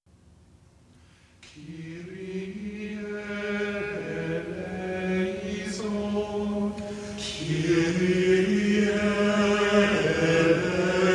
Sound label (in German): Lateinische Messe kyrie xvi; Adoremus Hymnal